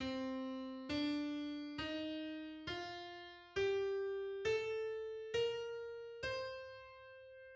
Dorian mode C

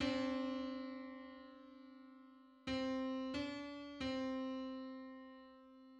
Five hundred sixty first harmonic on C

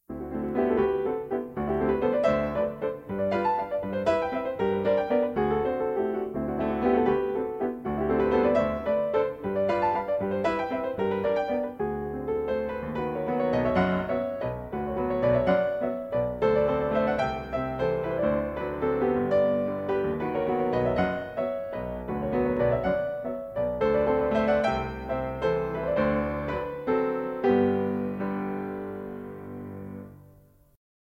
waltz; Brahms

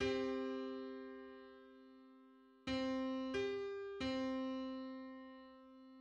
Seven-hundred-seventy-seventh harmonic on C

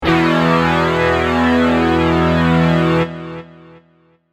Power chord on E

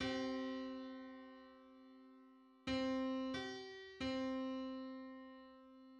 Hundred-eighty-seventh harmonic on C

